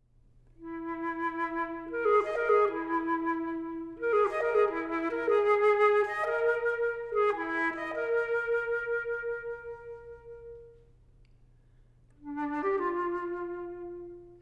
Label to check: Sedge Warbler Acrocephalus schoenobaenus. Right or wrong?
Wrong